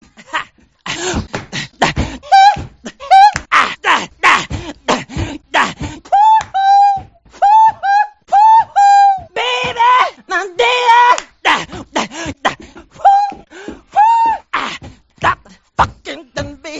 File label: grunts